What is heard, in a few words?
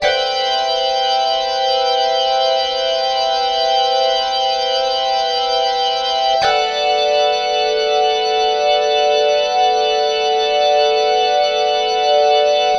Organ, Drum & Bass Keys; Sonic Foundry (Sony Creative Software); Knutrix Drum & Bass